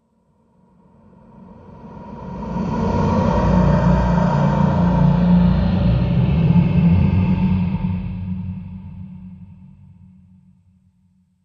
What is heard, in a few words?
Ghost Phantom Horror